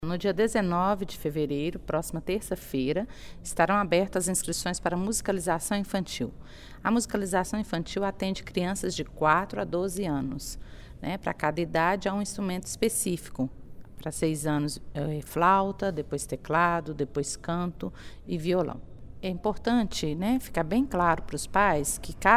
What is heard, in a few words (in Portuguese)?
musica